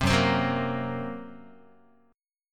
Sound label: Gb Major Flat 5th, down, Guitar; strummed; JGuitar